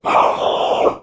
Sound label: Demon; little robot sound factory